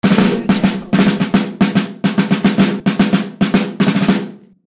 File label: solo on drum